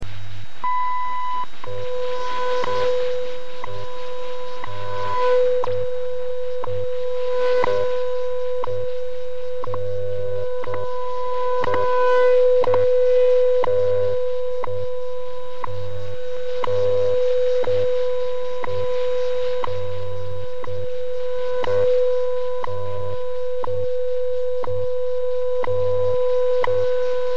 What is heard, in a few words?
10mhz no power